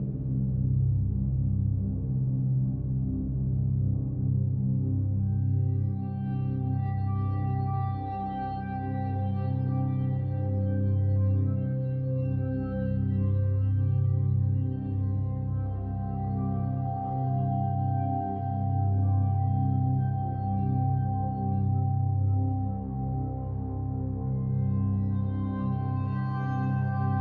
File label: Dark Heavy Sad; Cat Interstellar